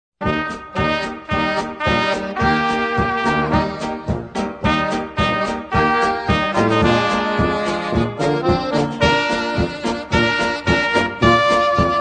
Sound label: polka